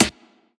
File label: crisp snare c sharp key; hits one shots